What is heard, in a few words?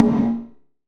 Perc, Gated Reverb; musicradar